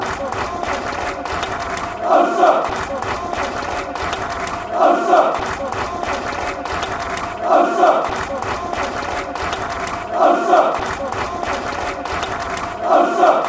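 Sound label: clap aldershot, football songs and soccer chants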